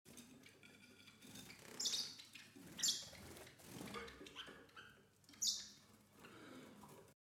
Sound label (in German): Voegel